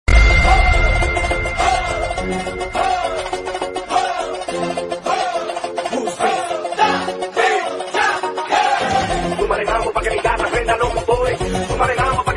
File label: Boy Attitude Entry Ringtone